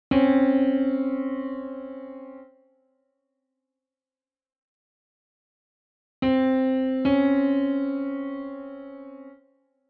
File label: interval